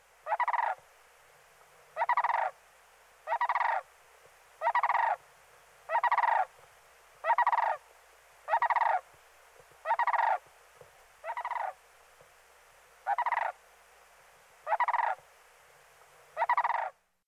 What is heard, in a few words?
sandhill crane call